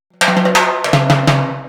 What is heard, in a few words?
080 Roots Reg Timbales; Digidesign; Pro Tools; Pro Tools Essential Demos; Essential Loop Demos; Demo Session - World